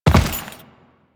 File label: Land Default, Footsteps; Enemies; Warframe; Teshin